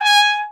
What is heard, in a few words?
Trumpet staccato G#4; Orchestral; DirectWave; Varazdin Orchestral